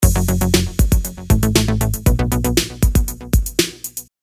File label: Test Beat Mono; libnyquist